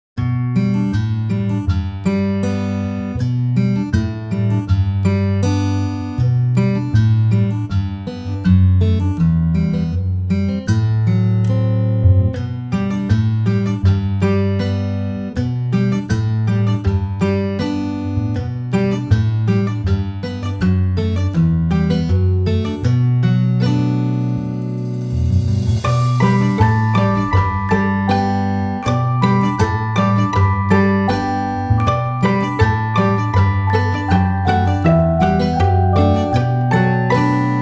Cute and Plucky Indie movie

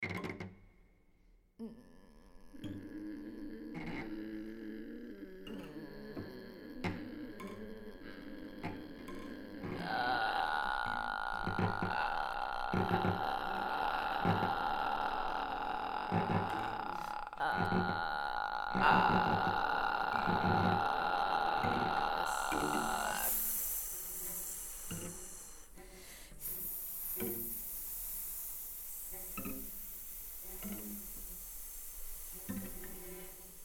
the cry of cicadas

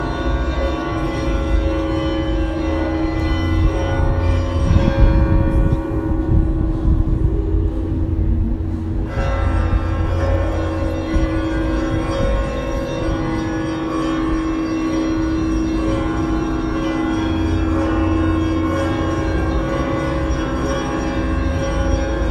Moscow Bells

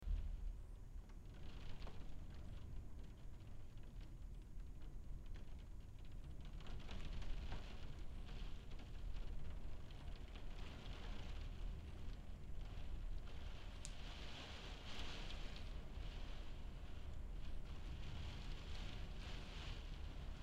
Rain on window

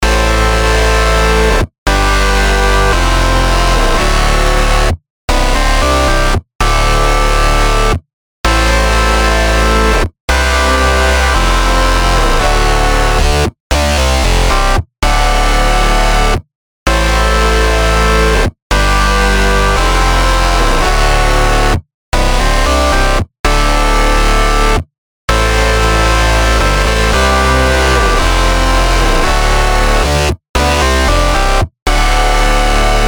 Synth Section

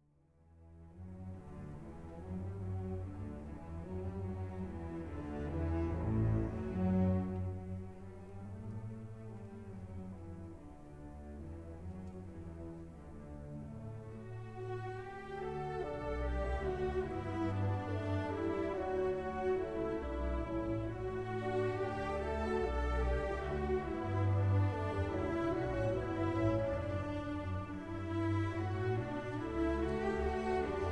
Bassoon; Beethoven 9; Chicago; Reiner

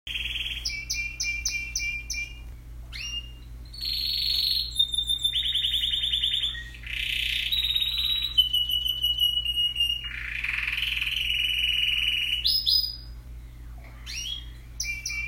Canary Singing

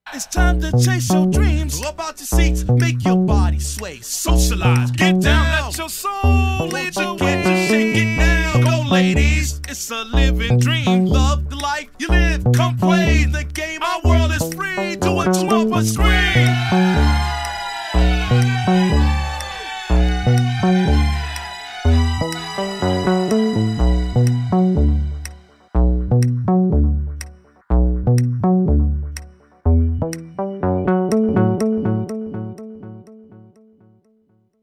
Synth Loop

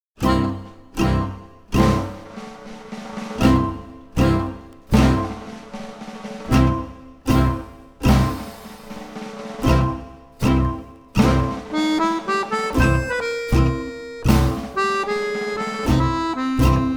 Low Blues

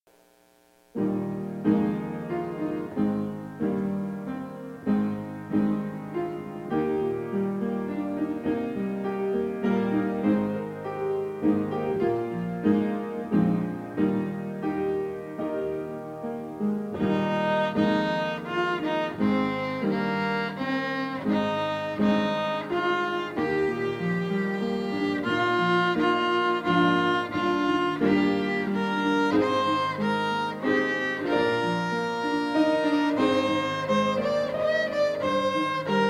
Piano Violin Instrumental; Be Thou My Vision

